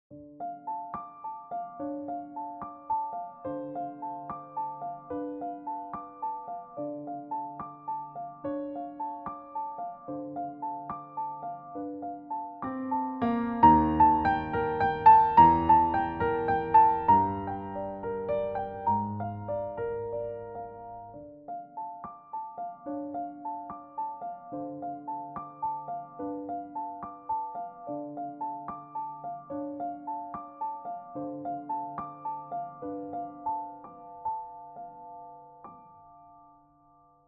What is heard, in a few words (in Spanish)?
Arpegios amplios